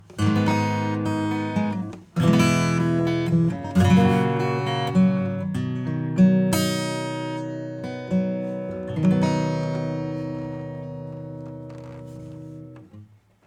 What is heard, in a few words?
acoustic